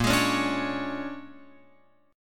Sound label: A sharp 7th Sharp 9th, Guitar, Open F; A#7#9; strummed; x,5,8,8,5,8